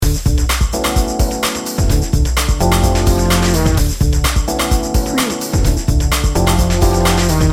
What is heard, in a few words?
busy beats loop